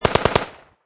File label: Machine Gun Ak-47 Short Burst Close Perspective; LYN084 Guns & Military sound package; Machine Gun Sound Effects